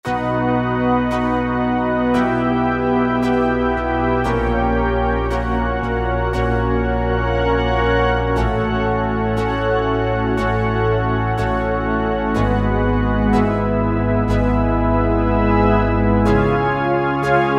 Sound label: JP4 Sharp Pad, vintage synth; Roland SR-JV80-04